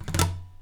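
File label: scrape, guitars; Spectrasonics; Hans Zimmer Guitars VOL-1; TAKA FX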